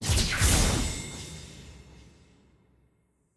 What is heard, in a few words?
lobby banner intro flare